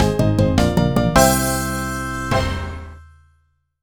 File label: Jingle Won; Junior Brain Trainer; NDS